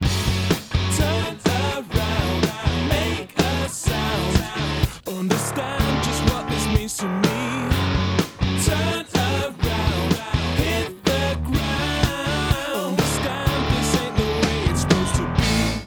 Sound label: Dist Red Pad Out